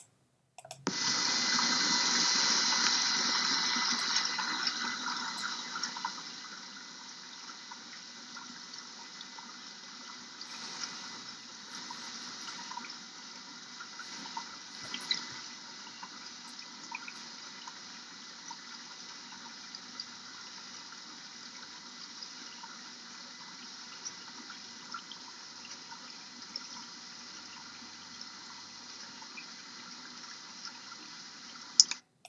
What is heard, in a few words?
Dishwasher Normal Quiet; Grand Marais MN; noise diagnosis